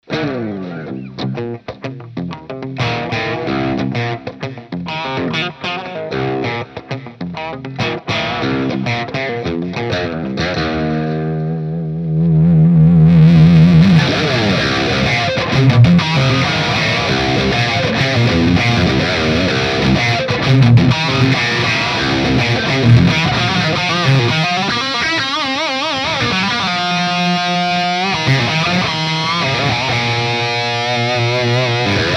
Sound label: Bogner 101B